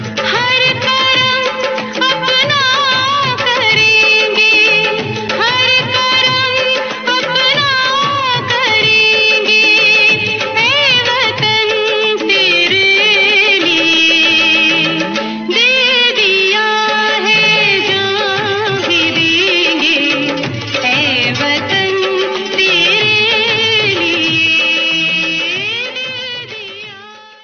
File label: Desh Bhakti Ringtone